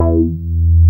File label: BAS Mini, BAS1SYN; Best Service Gigapack 2